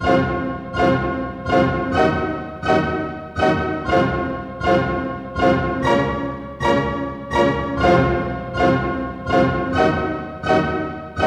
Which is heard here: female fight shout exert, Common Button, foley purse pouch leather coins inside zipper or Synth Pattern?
Synth Pattern